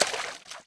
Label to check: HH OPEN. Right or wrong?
Wrong